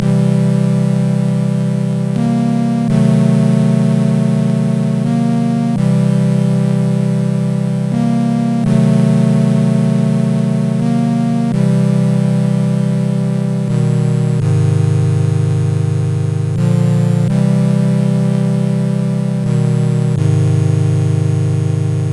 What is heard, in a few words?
dim test; MusicLine